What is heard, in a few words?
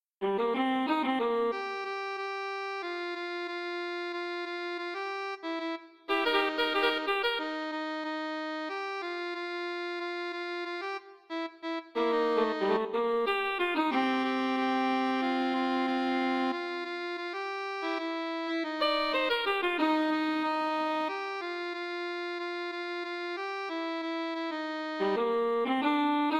instrumental solo c; banjo